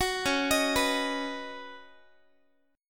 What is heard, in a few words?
C sharp 9th Suspended 4th C sharp down Guitar, chord; C#9sus4 arpeggiated; 21,21,18,21,x,x; JGuitar